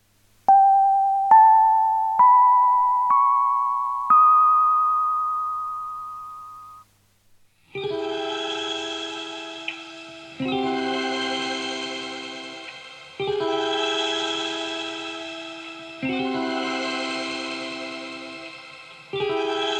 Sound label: Instrumental